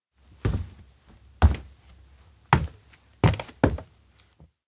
steps outdoor school stumble in; chiharu; anemoi

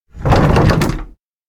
door janitor room open rattle; anemoi

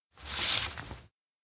envelope pass cash; anemoi

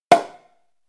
champagne pop; anemoi

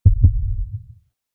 elec heartbeat; anemoi

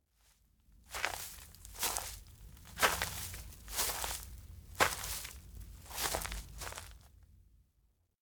steps outdoor grassland walk; anemoi

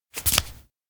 racket take; anemoi

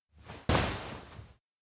hug; awayuki; anemoi パッケージ版 1.1

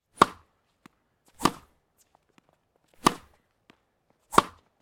tennis rally; anemoi

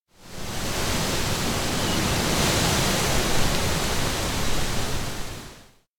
wind; anemoi

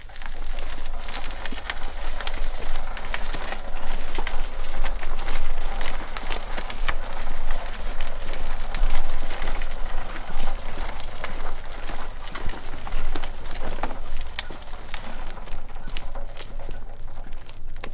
cub walk push dirt loop; anemoi

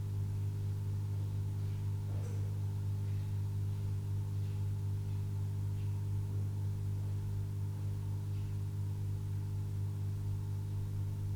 supply room toilet

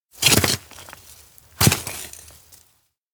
shovel dig slow; anemoi

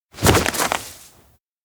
bump belly; anemoi

